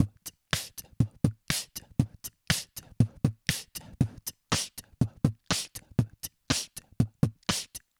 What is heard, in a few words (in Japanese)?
8ビート